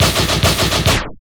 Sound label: Fills, Loops 140 BPM